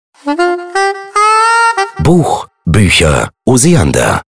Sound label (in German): Soundlogo; Audio Branding – Akustische Markenführung – Sound Logo – Audio Logo; Osiander Buchhandlung